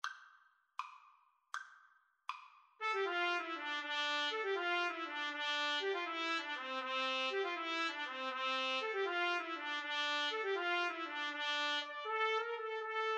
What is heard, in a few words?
brian boru trumpet duet